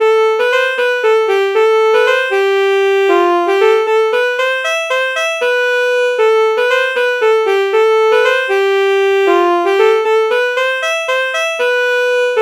alto saxophone alto saxophone melody morph

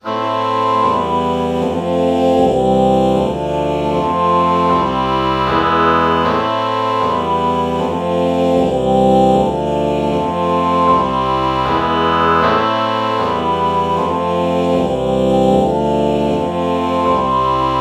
oh oboe chord morph

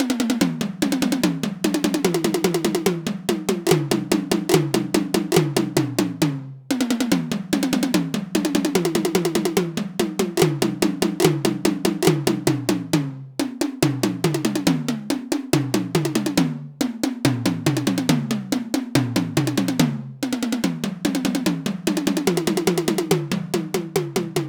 melodic tom

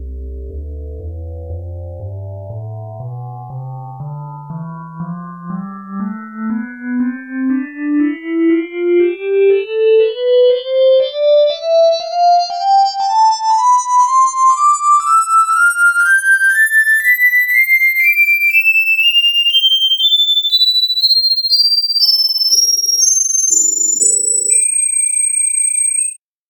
metal pad tonleiter samples

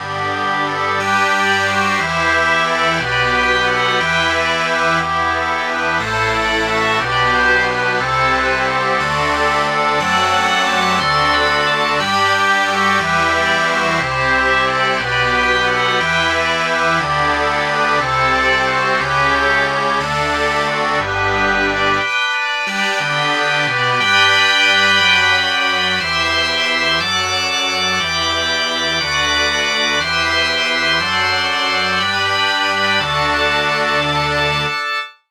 bagpipe

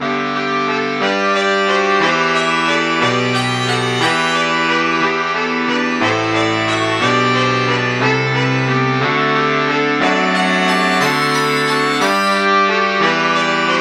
baritone sax

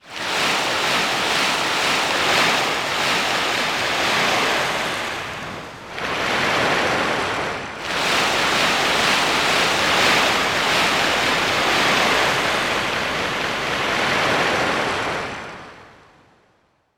sea shore

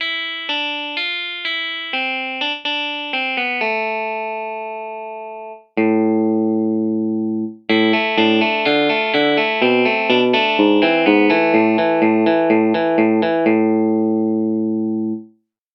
clean guitar

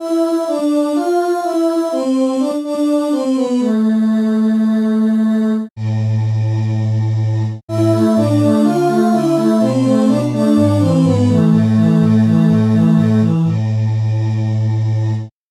synth voice

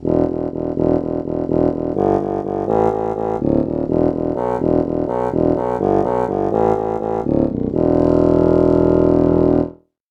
bassoon bass

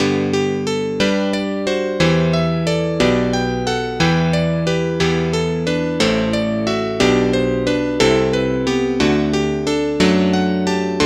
electric piano; SpectMorph Evaluation #4

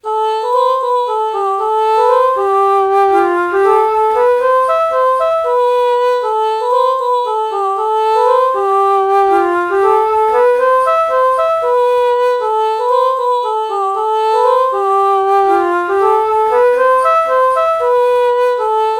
alto flute ah melody morph

